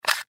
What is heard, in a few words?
Mini Dv Tape Case, Mini Dv Tape Case Remove Tape; Tape Players; Blastwave; Technology